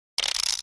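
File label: Mechanical Gear Ratchet; machines winches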